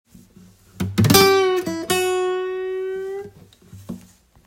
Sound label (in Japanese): クォーターチョーキング